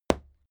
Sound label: fight impact sweetener tiny